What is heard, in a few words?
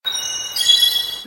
chime; raising heart; nanoha